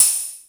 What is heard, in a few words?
Beat Box; E-MU Pro-Cussion